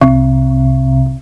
Bong; Cloudz 1.0.3

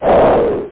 ARROW

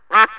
QUACK; Music Ace 2

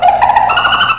Hyena

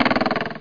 bird spin vibe; Franklin's Reading World

